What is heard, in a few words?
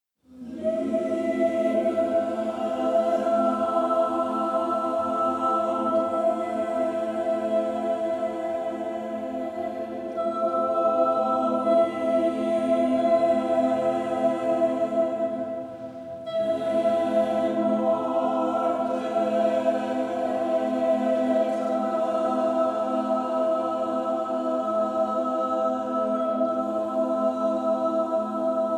Default Loading Music; Battlefield 1